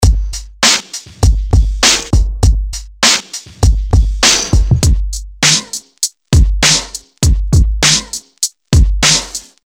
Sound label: timestreching